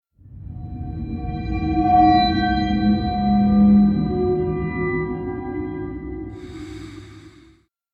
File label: Stinger Decrease Action